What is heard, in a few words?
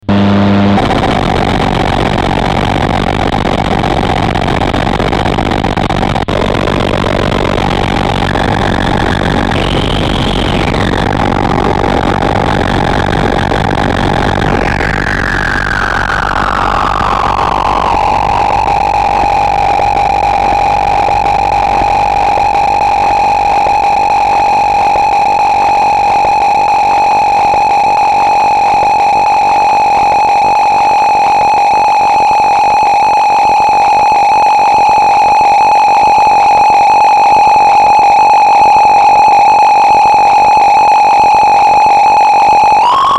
inharmonicity of overtones